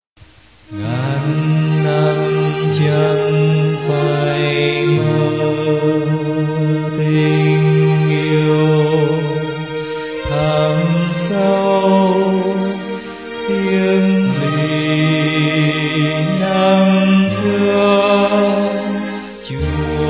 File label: alto